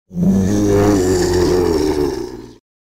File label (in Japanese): モンスターの鳴き声